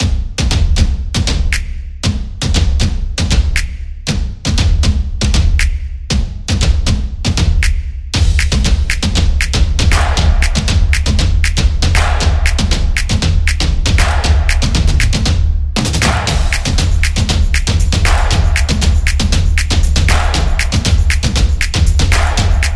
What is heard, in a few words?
The Percussion Dynamic